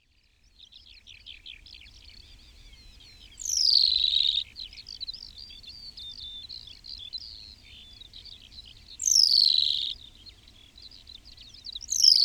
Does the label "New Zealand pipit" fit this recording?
Yes